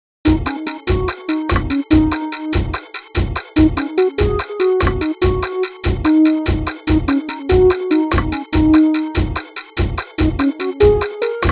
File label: Cafe (inst)